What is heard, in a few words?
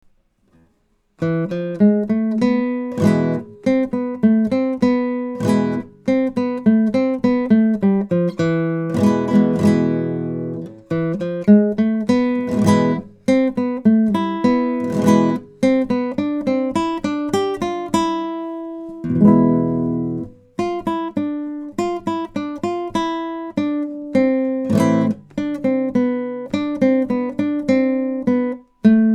Guitar; Gitarrenmusik